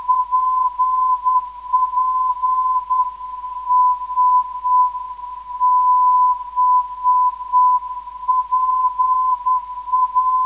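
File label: 414kHz, non-directional beacon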